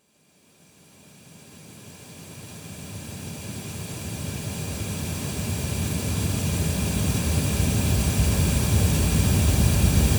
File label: 140BPM Sub Attack; FX Fills; 8Bars; Vengeance Samples